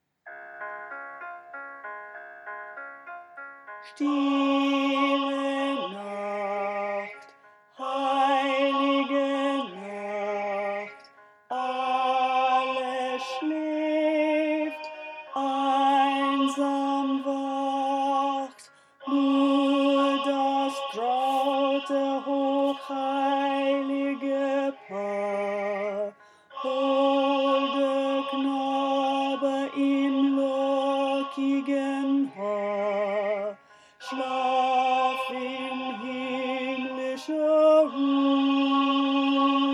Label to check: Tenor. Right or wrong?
Right